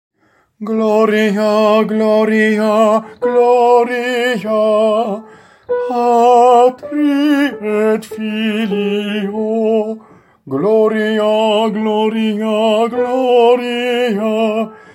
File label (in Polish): TENOR; Gloria Patri